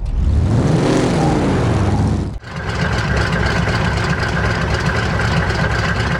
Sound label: rev, vehicles cars; lwcars